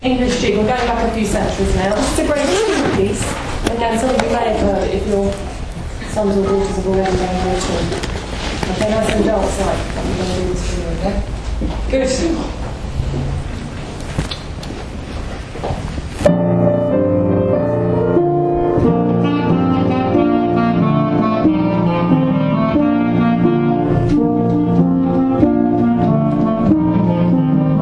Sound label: clarinet